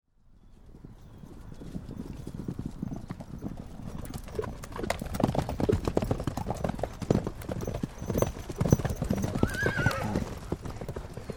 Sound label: Horses Cattle Drive; Animal; Horses (Sound Effects:Animals)